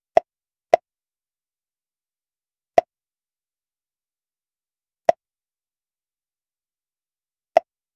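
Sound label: pop up button in ps1 style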